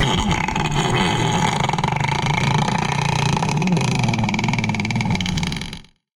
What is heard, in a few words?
snarl; Barotraumatic; Crook